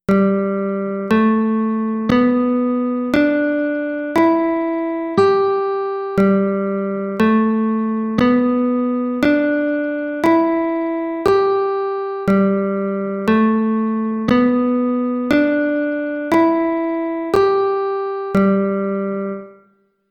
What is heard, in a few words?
G Major Pentatonic Scale Guitar